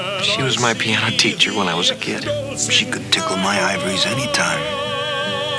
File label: tickle